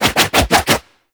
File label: Reaper Melee Charge Spin Whoosh; Warframe; Wraith